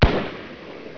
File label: MUSKET; 枪炮声音素材